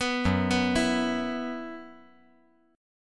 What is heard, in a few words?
F sharp 7th Suspended 4th